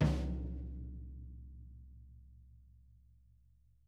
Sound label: Drum Kits; Sonic Reality